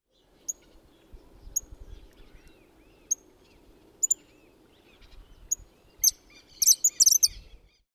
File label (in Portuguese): Suiriri-cavaleiro Machetornis rixosa; Jacauna